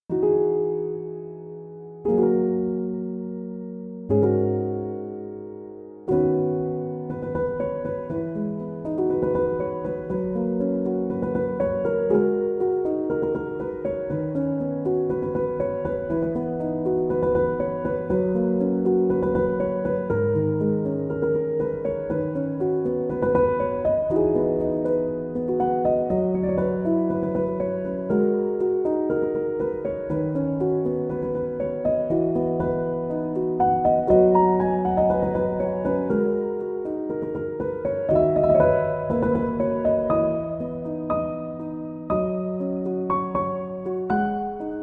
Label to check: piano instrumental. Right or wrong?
Right